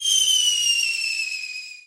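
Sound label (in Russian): свист летящей бомбы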